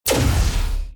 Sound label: Ship Weapon Guided bomb